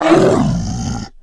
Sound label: were bear die; Seer; GamigoNA